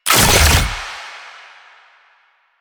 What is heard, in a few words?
Fuel Projectile Pick Up; JadeShadows; Ascension